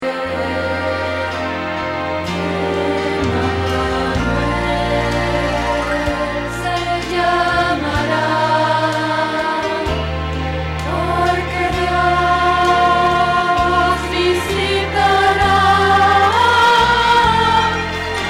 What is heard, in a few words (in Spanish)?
Contralto